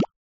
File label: button click; gardenbloom